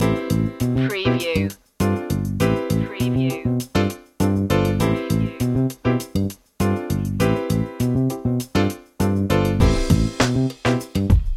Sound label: jazzy lounge smooth